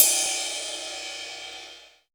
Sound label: Ride; Vengeance Samples; VEE Cymbals